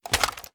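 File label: assault rifle reload fast end; gmod; viper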